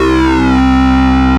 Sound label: Ensoniq ESQ 1 Eoww C2